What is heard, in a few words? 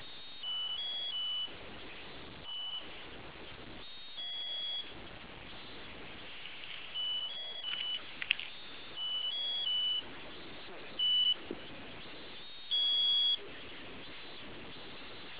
fireworks